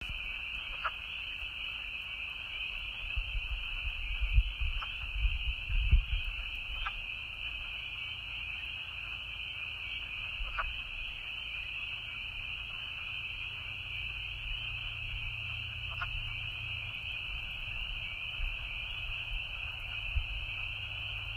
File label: Peepers Wood Frogs; Lake Mansfield natural area